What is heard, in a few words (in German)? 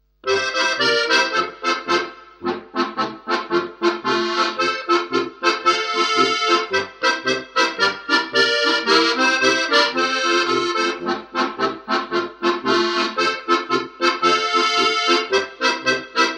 Polka (1. u. 2. Stimme)